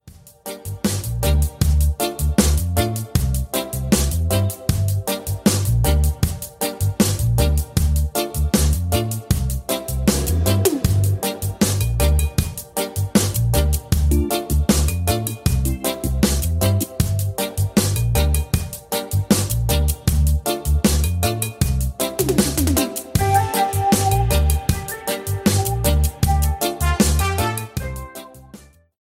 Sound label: sweet reggae ringtone